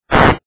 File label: ELECTRICITY SINGLE